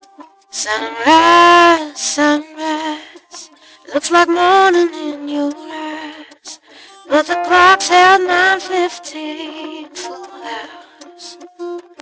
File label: voice